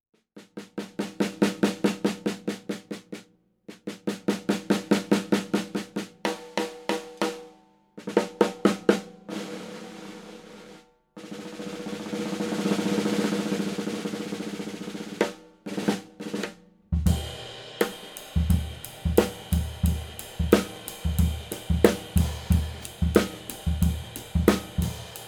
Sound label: Yamaha 55x14 Brass